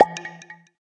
Popup; Rabbids Big Bang; Android iOS Windows gamerip 2013

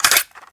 Remove Cassette

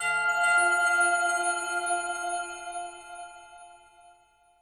Rebloom Bell; Musician

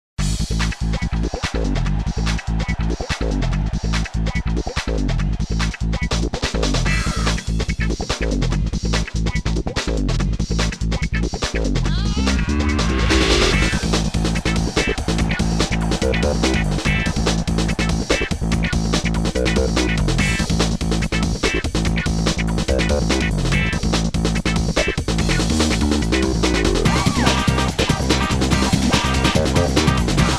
Win; Need for Speed 4 High Stakes; Electronic Arts

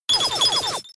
Autotomize; pokemon reborn e19 2022

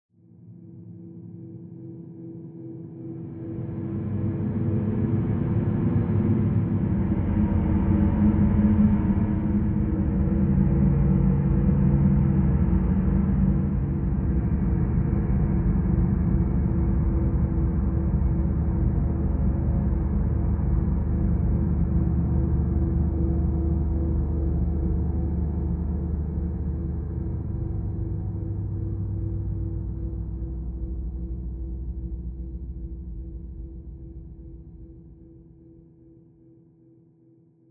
Subway Gas Mask Explore Mood

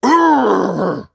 worm charge; Hollow Knight

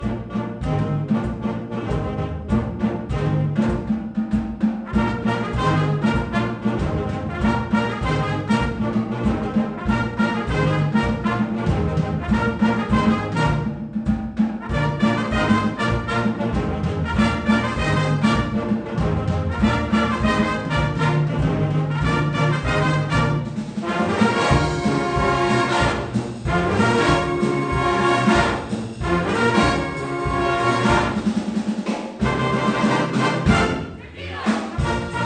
Menu Song; NCAA March Madness 98; PS1

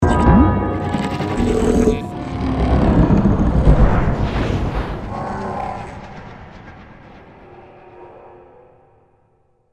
Cave Guardians Blocked

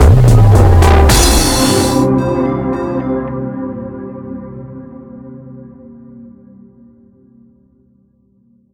Transition

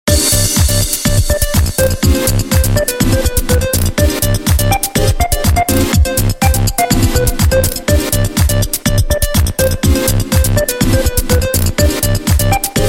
BGM Wave Test Result Fail; Dynamix

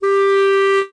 Clarinet tone; lego my style preschool

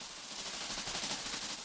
weaver spider walk loop

